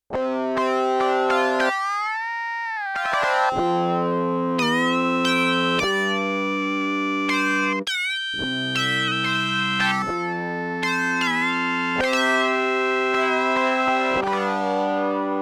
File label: Slide Guitar; Rock Performance 2